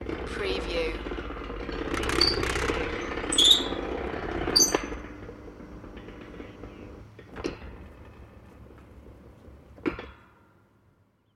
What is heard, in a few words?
automatic metal gate